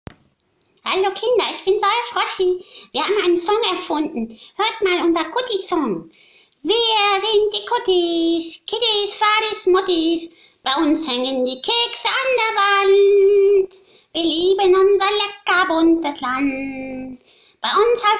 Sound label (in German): Froschi singt den KuTi Song